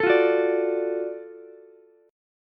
F sharp Minor Major 7th Sharp 5th F sharp down Ukulele; strummed